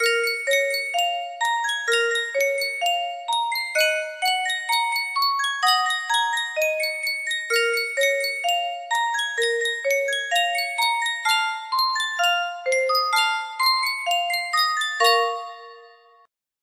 Yunsheng custom tune music box

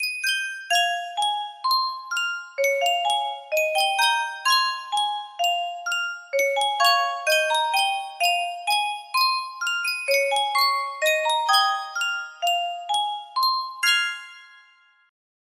Yunsheng custom tune music box

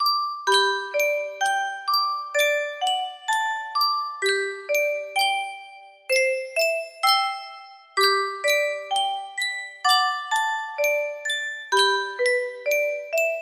yunsheng music box, national anthem; samoa

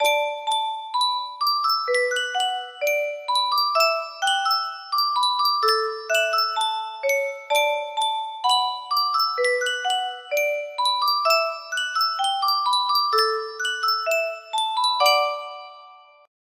Yunsheng music box, music box melody

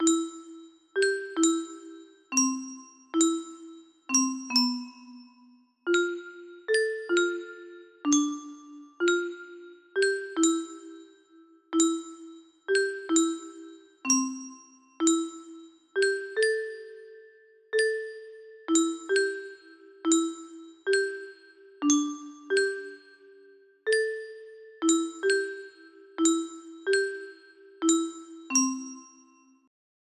music box low

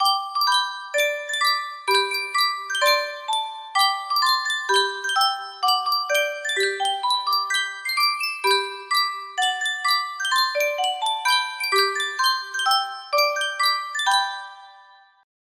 Sankyo Music Box, music box melody

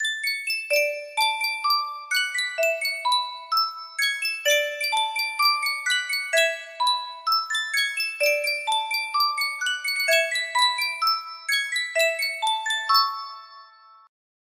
music box, Yunsheng

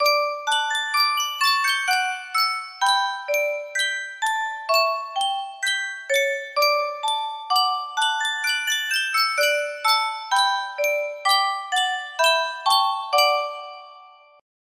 superstar music box

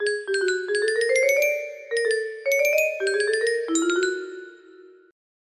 music box melody

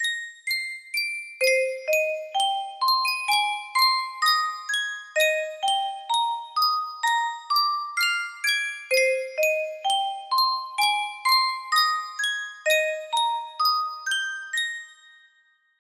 Yunsheng custom tune music box

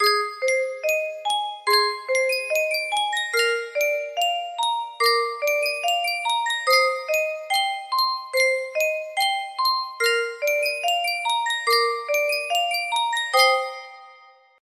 Yunsheng music box

